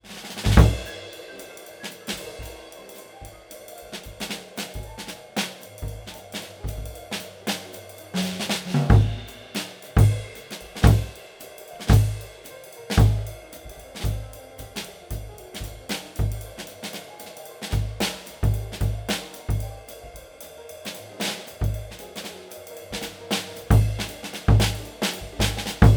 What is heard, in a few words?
Kick Beater